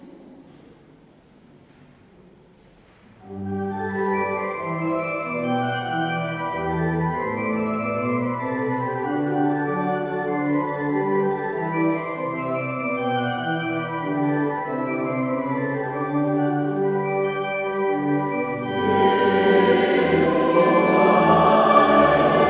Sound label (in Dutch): Jesus Bleibet, Koor